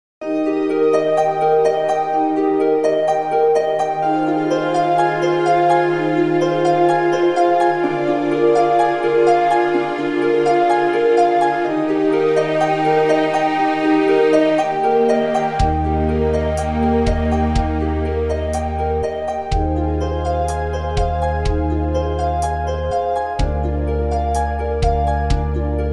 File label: bach guno minus brass; klassik